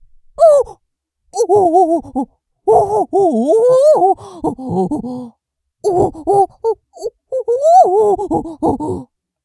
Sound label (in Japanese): お?♥おっ♥おおっ♥おほぉ…♥